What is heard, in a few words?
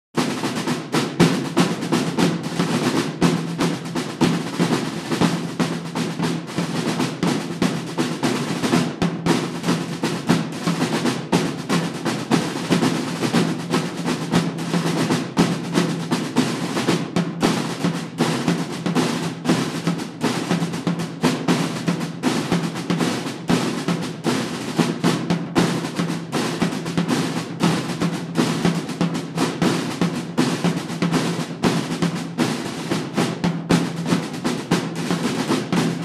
Riff; Musiques de la Saint-Michel de Voujeaucourt